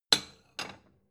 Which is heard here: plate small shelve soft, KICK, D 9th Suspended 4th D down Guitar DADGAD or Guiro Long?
plate small shelve soft